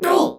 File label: Grunt Hit; Koga; Paladins